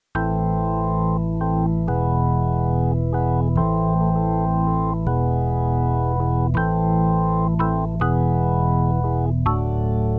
space bass intro

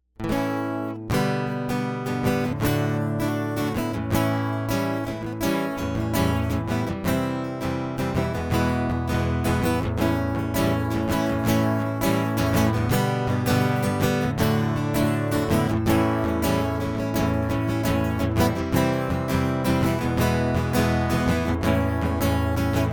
guitar prelude

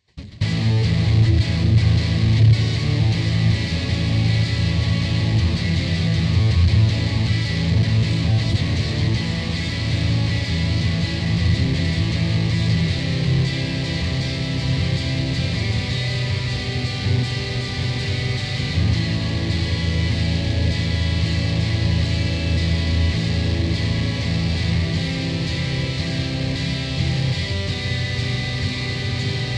electric

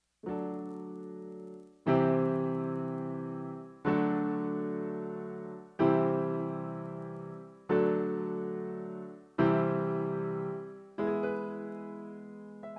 piano; MegaEpix

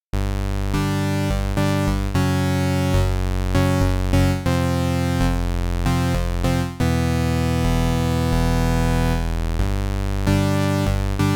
space intro; Down Below

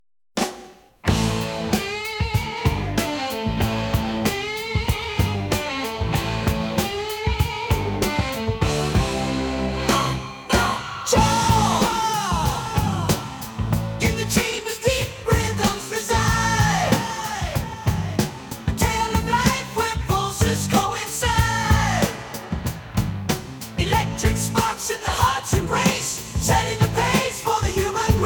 Heartbeat

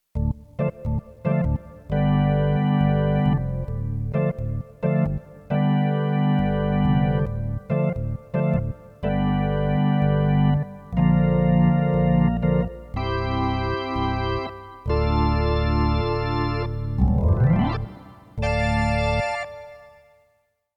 organ prelude

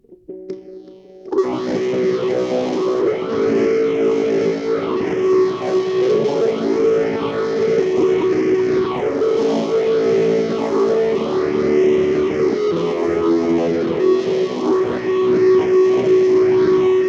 electric

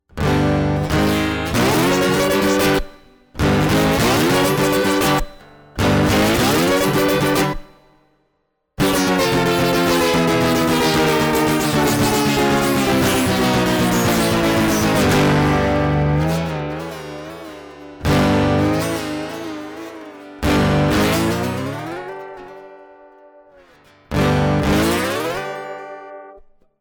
acoustic; MegaEpix

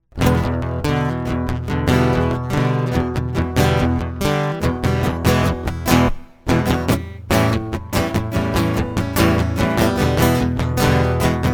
acoustic

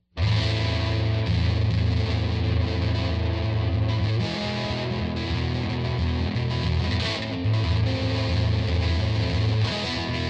electric; MegaEpix Enormous

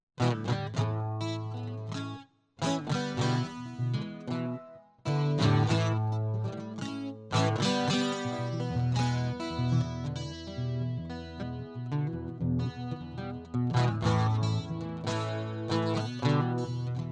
acoustic; To Be Succinct

